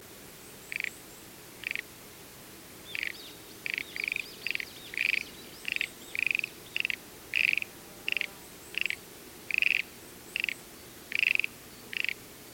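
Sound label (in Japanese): shuregeru, Schlegel's green tree frog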